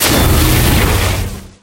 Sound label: gadget; Brawl Stars